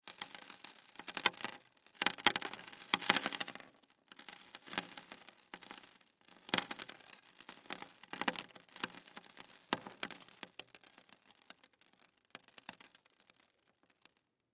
Impact Debris Dust Small Wood Pieces Fall Wood Surface; Blastwave